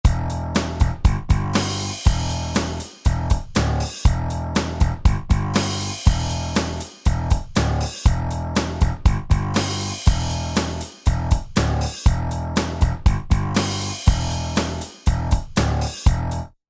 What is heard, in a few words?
Rock